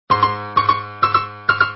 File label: piano